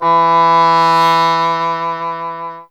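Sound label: English horn; Miroslav Vitous - Solo Instruments VOL-2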